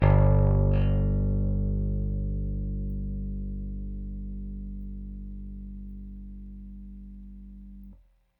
Rickenbacker Bass; Airwindows

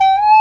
BA WU G 3

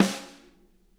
SNARE, Interactive Drum Kits; Sonic Reality

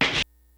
GREASY KIT, DRUMS; Kid Nepro; Elite

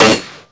SOUND EFFECT, Metal; AKAI S6000 CD-ROM - Volume 1

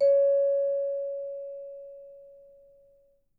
C#5, Percussion & Harp; Miroslav Vitous

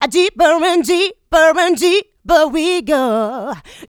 Female Vocals; Houseworx

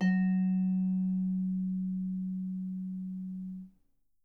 F#3, Percussion & Harp; Miroslav Vitous

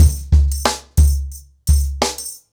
Nuclear 90BPM, ACOUSTIC DRUMS

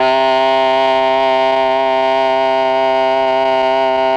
OBOE; ILIO; Propeller Island Legendary M400; BRASS+OBOE

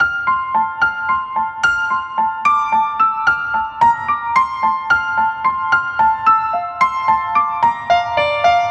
Piano Arpeggio; Trip-Hop; Loops; Sonic Foundry (Sony Creative Software); Rondo Brothers Trip-Hop Loops & Samples; Apologists

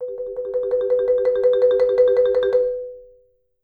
Marimba roll B3; Sampleheads - Dave Samuels Marimba & Vibes

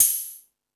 High Hat, Drum Machines

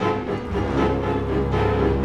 Orchestral Loops

